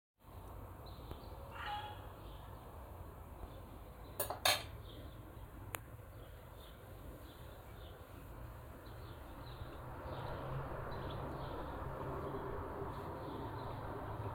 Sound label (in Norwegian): fuglelyd